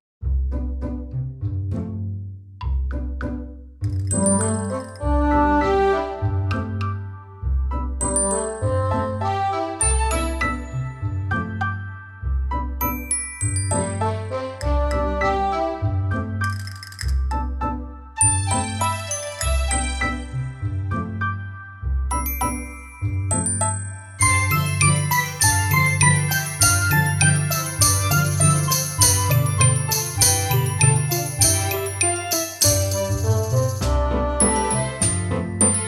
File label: cute creatures